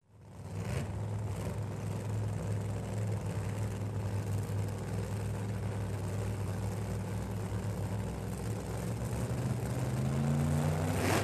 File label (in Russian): dragster na starte trogaetsya